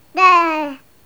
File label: baby talk daa, 1 year old baby talking indistinctly